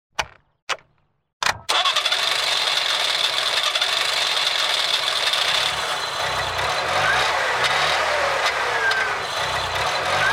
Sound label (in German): DDR trabi sound